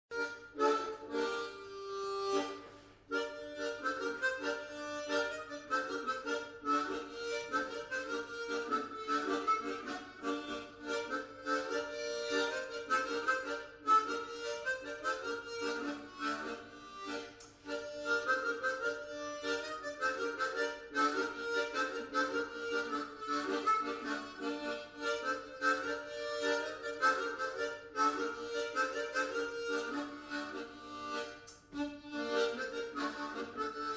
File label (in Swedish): Polska; efter Arnstrom